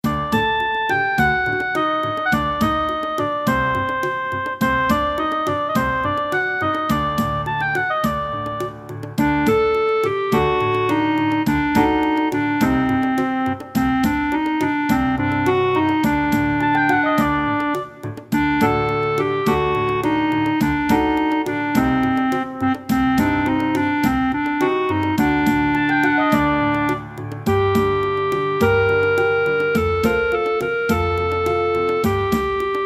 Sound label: karaoke